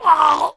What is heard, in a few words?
male scream; npc; DeadFright